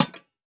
灯笼放置声

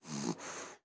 狐狸睡觉声

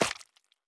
蜂箱 滴蜜声

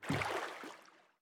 船在水中划桨声

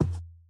骆驼脚步声

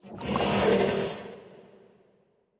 骷髅马水中嘶鸣声

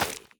根 破坏声

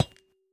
铜踩踏声

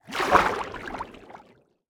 进入水下声; ambient

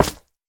蛙明灯破坏声

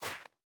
细雪破坏声

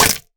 山羊角断裂声

